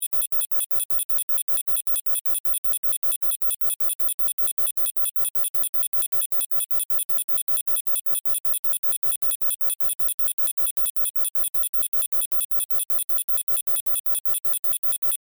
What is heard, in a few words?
Alarm Emergency, Scifi, Alarm, Emergency, Console, Science, Fiction; Tech